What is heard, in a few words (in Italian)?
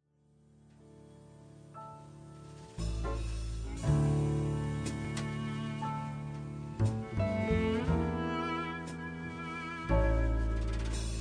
CIACCONA, violino solo; Bach in Jazz; dalla 2^ partita per violino solo 1° parte